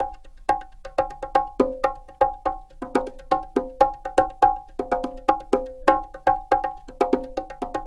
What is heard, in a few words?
Conga Loop